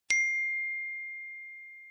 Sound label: Ting Iphone Message Tone